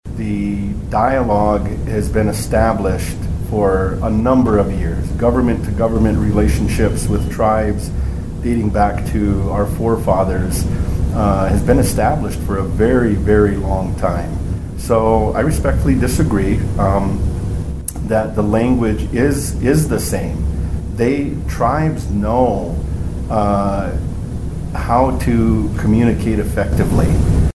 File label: Flute